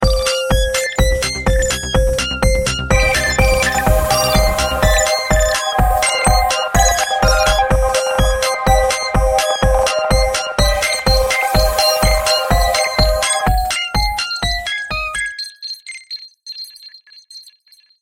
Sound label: Robot